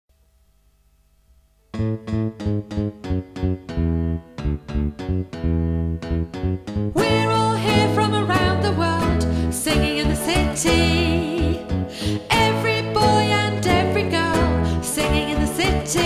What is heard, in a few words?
Singing in the City VOCALS, choir